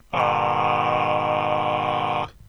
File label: M4 minor; harmonized sounds